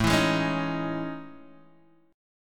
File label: A Major Sharp 11th, Guitar, Half Step Down